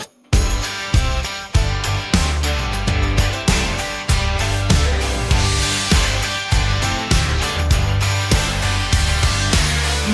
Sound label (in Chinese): 丧尸末日乡村摇滚：加油站大逃亡，班卓琴速弹与霰弹枪上膛节奏(Zombie apocalypse country-rock gas station escape, banjo shr; DiffRhythm